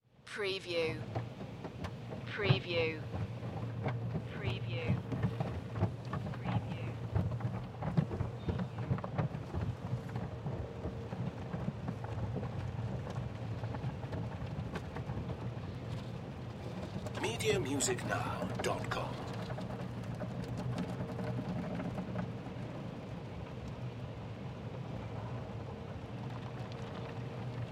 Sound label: Car Wash Car Interior